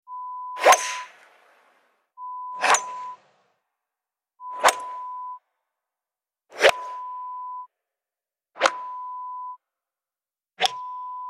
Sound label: Golf Swings